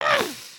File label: collapse